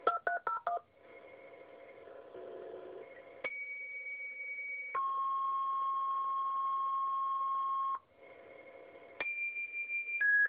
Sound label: Tone Fire